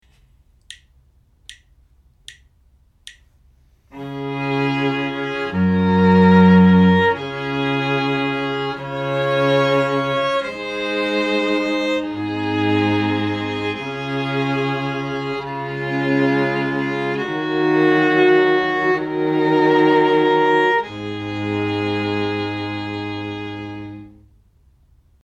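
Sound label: harmonic dictation, string quartet; ear training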